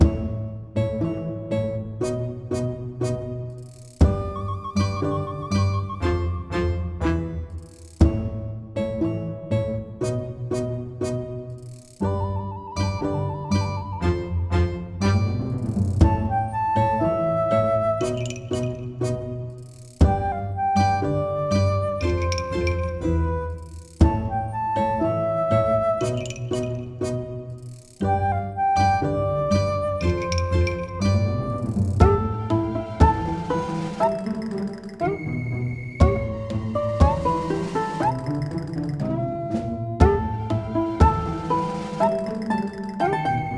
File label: Quirky